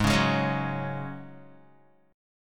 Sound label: Ab 7th Suspended 4th Ab down Guitar